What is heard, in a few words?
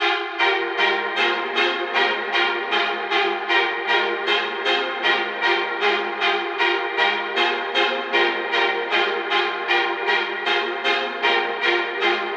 Stacatto Strings, 155 BPM F Min; Cymatics; Domination